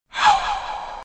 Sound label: speed msg; ringtones; sms